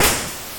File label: ghost explosion